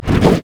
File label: ancient golem basic attack, monsters; LoL